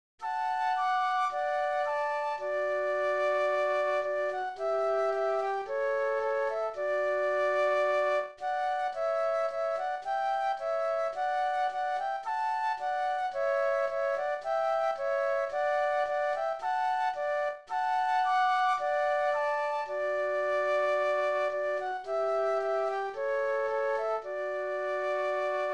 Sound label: Flute Duet; christmas